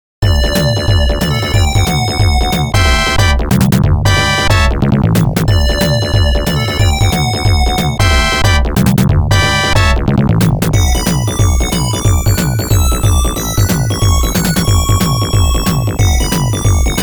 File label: BOSS CLEAR; darius 30th anniversary box